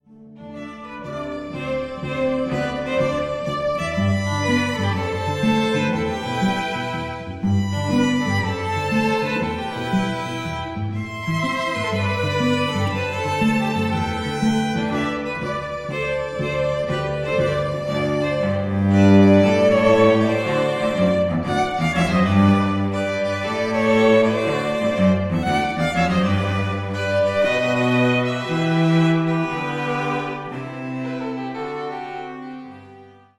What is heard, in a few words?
String Quartet; Wedding Music; Ann Arbor, MI; White Pines Entertainment